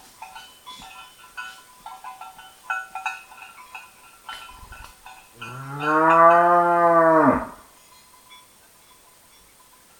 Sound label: Bos taurus; ecologia paisaje sonoro